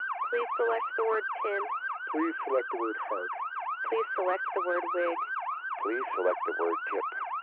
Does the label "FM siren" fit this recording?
Yes